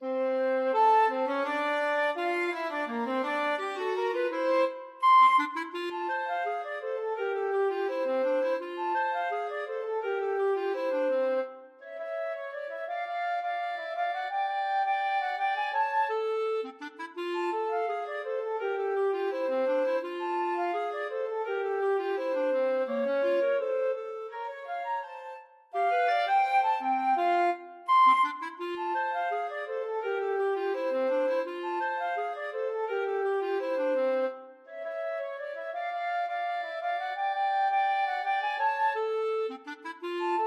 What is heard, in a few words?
euphonic sounds flute clarinet duet